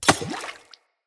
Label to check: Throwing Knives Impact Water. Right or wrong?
Right